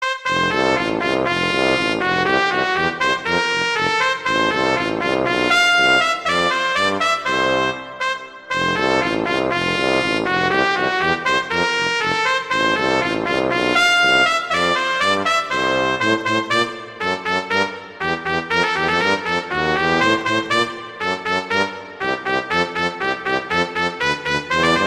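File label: Duet, horn; Mozart; Duets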